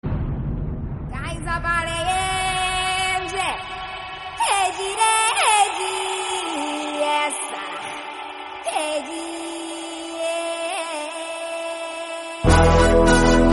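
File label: background music Theme Ringtone; Businessman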